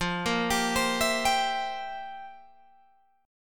F Major 7th Suspended 2nd Suspended 4th, F, down, Guitar, Open G